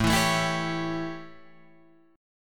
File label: A 7th A, Guitar Drop D